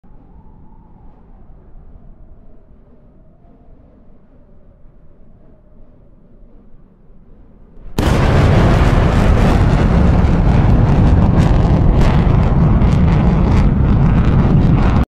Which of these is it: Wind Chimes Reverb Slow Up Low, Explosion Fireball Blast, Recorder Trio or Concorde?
Concorde